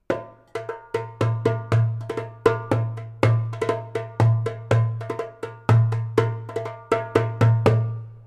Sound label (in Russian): darbuk